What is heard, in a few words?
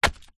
footstep single bricked ground; foley; zapsplat